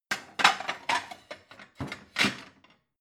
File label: plate multiple shelve